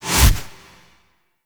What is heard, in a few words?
Melee Damage Multiplier, Whooshes; Warframe; Weapons